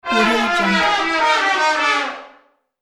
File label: laughing trombone cartoon fail